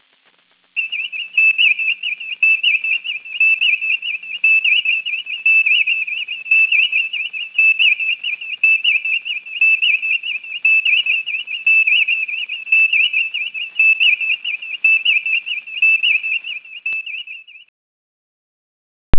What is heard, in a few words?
main scanner; startrek